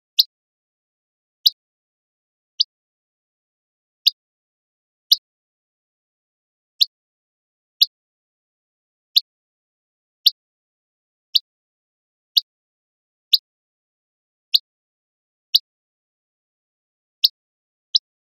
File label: calls